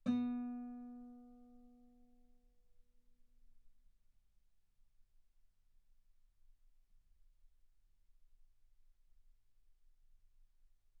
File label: Guitar ff B3; sul B